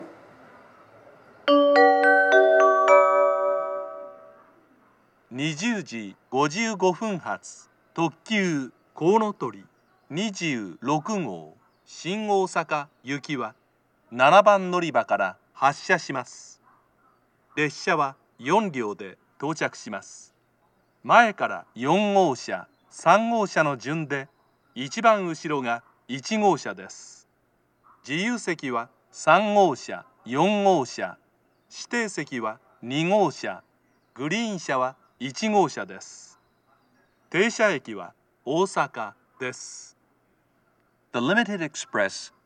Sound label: limited express; kounotori26; shin-osaka; jr-west; amagasaki